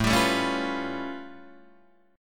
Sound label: A Augmented 9th A Guitar Open D